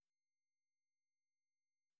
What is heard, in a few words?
ultrasound with fade no clicks